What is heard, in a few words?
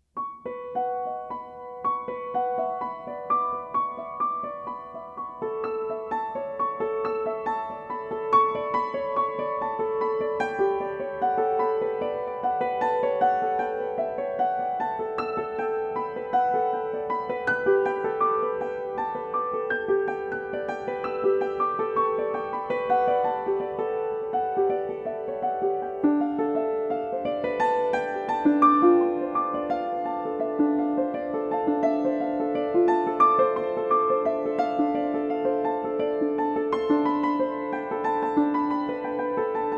Rain drops